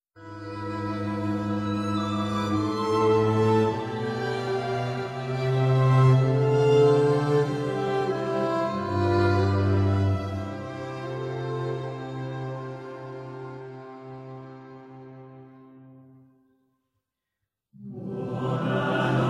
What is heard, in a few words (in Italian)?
Buona note cara popa con strumenti intro